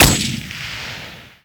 Gun Shoot Fire

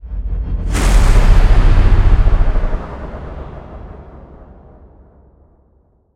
Stinger Modulate

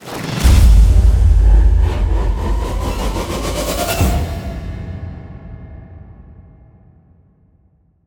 Stinger Modulate ramp

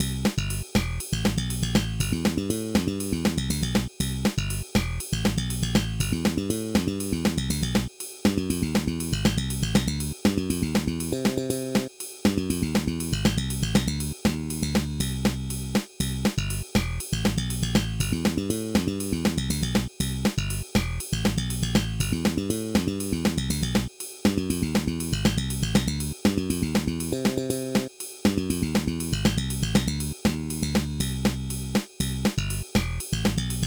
Ground Math Game Music; MarioGunner Sound Selection, Vol. 2

